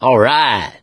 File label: positive noise, mechanic, survivor, voice; source engine; left 4 dead 2